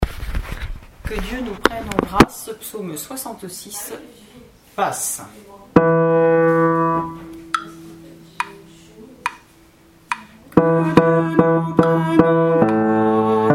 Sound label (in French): Psaume 66 Que Dieu nous prenne en grâce BASSE; Gouzes